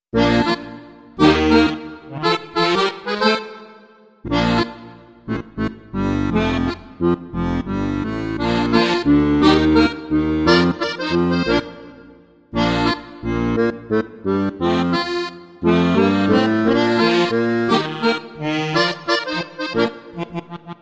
Bavarian Calypso No Beat

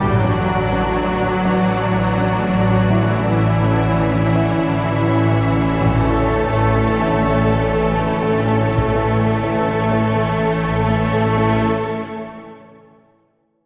Bittersweet Contemplation, Bumper

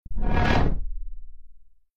Vortex

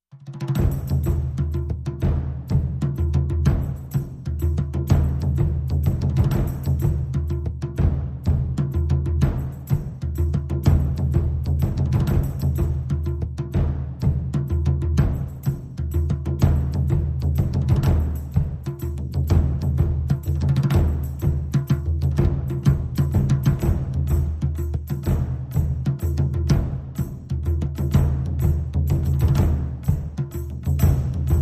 Percussion Only

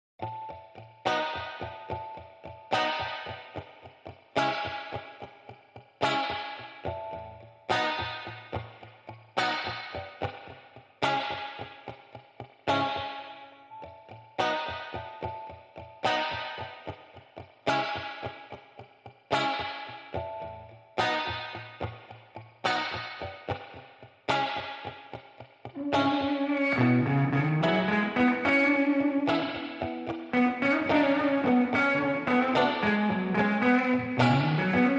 Guitar

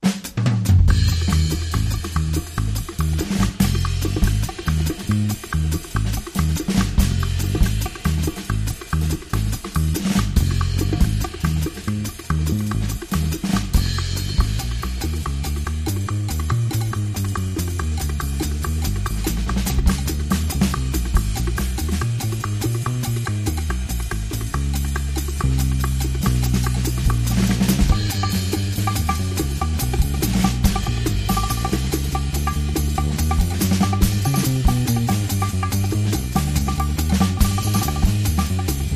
Drums and Bass

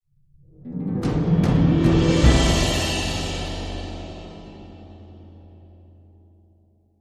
Medium Build Harp & Hit Sting

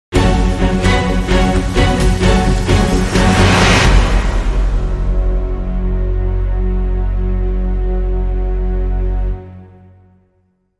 Dramatic Sting

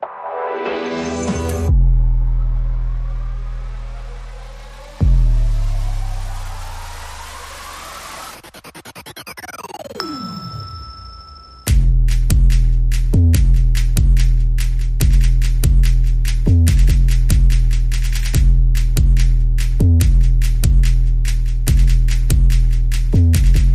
Drums

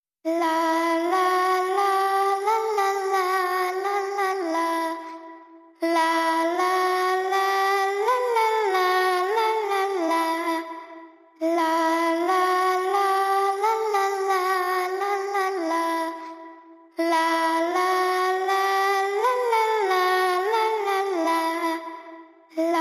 Main Voice Only

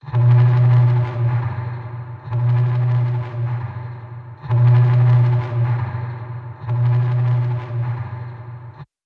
Foghorn

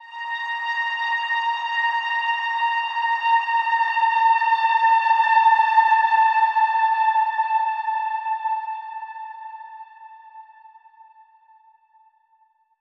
Eccentric Echoes Eerie Bend

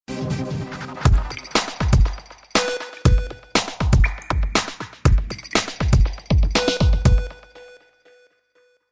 Beeps Drums & FX

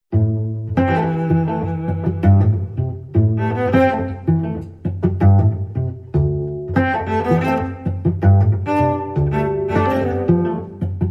Cello Suite 1 Variation 4

